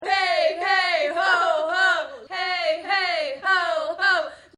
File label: Female protest chant; Chanting; Voice